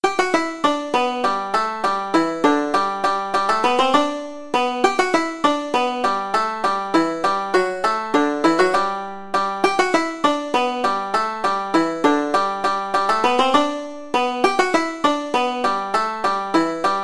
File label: Girl I Left Behind Me, banjo